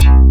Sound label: FM8ST, SYN BS; AKAI S6000 CD-ROM - Volume 1; SAMPLE WAVE MIXING 1